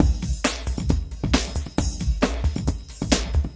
BIG ELECTRO ACOUSTIC BEAT, PROCESSED ACOUSTIC DRUM KIT; Sonic Foundry (Sony Creative Software); Vir Unis Infusion